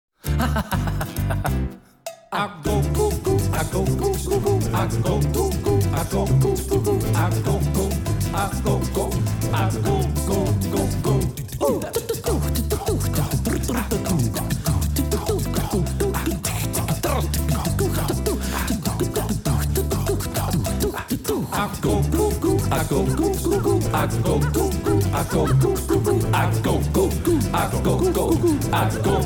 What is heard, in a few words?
Agogo SATB Solo Perc.; komponiert als Special WARM-UP; L. Maierhofer; Kompositorische Specials